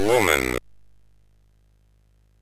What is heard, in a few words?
sid MOS6581R2